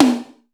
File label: TOM; Crypton - DrumBase5000; DANCE KIT #3